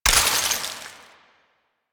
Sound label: Ammo Pickup Cold; DE; Warframe; Warframes; Frumentarius; AmmoAbility